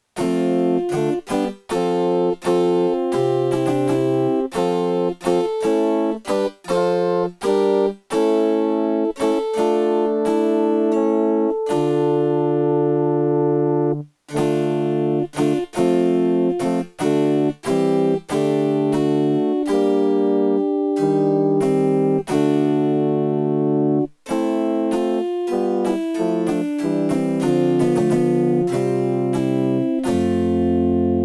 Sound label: Intrada F Lydian